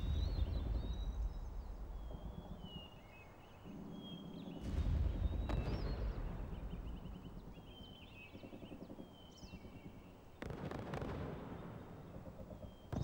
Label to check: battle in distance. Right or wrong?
Right